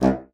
bassoon C2; Xsample VOL-6

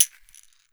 CAXIXI, Brazil Drum Perc; E-MU Producer Series Vol. 7 – Old World Instruments

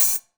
HH, Drum Mashines; EdgeSounds

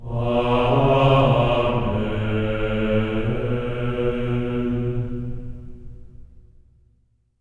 AMEN B3, Symphony of Voices; Spectrasonics; GR AMEN

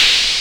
HH OP; HH OPEN; AKAI; USB Soundscan vol.20 - Fresh Disco House I

